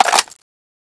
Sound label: mag in, m14ebr